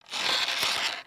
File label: bow pull back long; weapons; aronwk collection; ndaudio